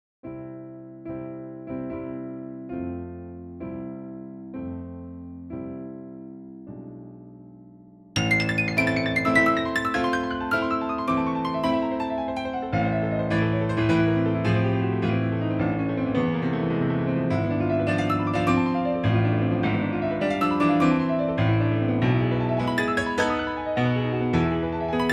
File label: Korg Triton Rack